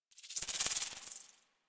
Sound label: Foliage Insect; Warframe; Duviri